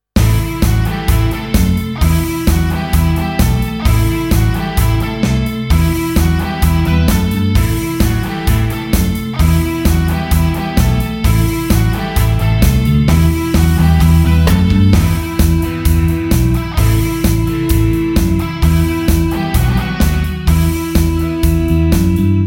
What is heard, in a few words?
Em Riff Rock Straight 130